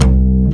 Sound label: Upright Bass Notes